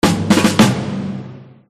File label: leader assigned